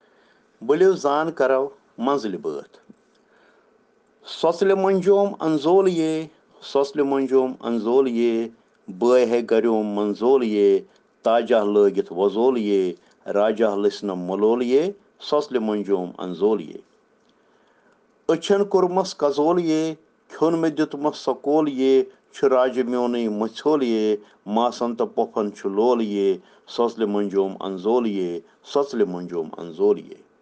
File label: Children Rhyms in Kashmiri